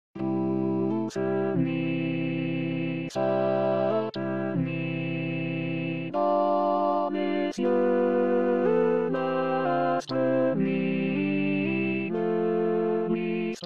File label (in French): douce nuit